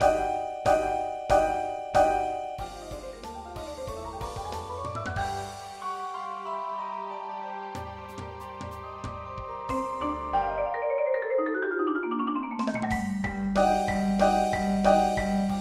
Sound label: Playalong Segment Front Ensemble